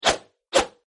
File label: attack; Power Rangers vs Teenage Mutant Ninja Turtles - Ultimate Hero Clash 2; power april